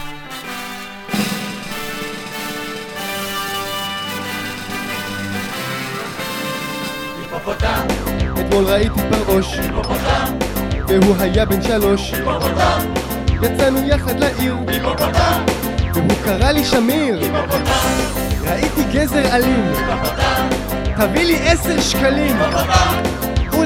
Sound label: opening song; hipo